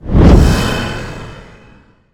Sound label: angelic activate; smite; thanatos